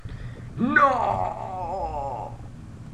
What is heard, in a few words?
No Scream